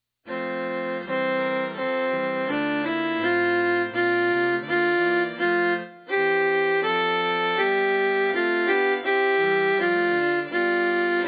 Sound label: alto